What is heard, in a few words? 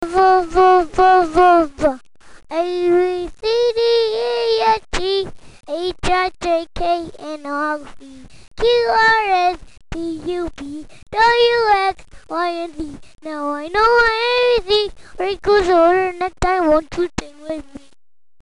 singing